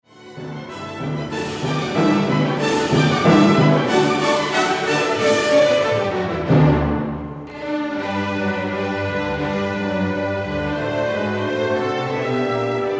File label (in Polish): sugestywnie i śpiewnie Horenstein prowadził melodie w partii smyczków; Scherzo; IV symfonia Gustava Mahlera część trzecia